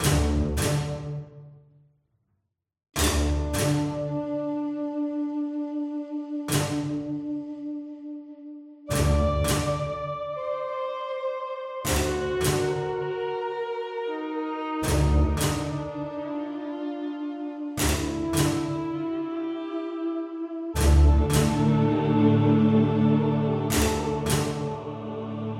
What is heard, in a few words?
Loop Fight; Game of Thrones: A Telltale Games Series